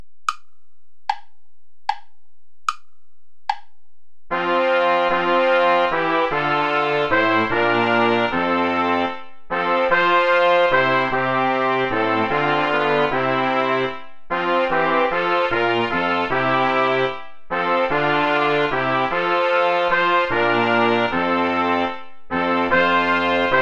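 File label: nun ruhen alle wälder play along without trombone; gesius bartholoma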